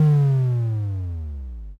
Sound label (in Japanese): zuun